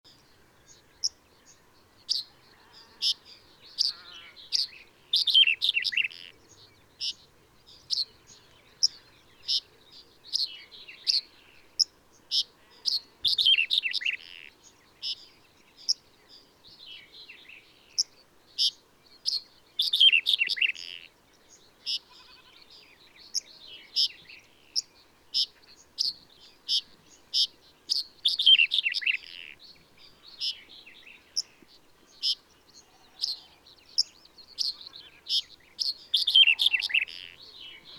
Red-headed Bunting Emberiza bruniceps, male, song, Karaguma, Kazakhstan